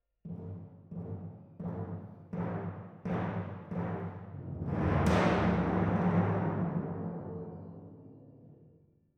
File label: Timp